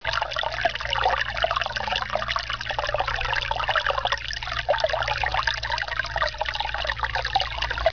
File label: water